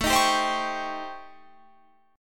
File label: A 7th Sharp 9th Flat 5th; x,x,19,20,19,19,21,22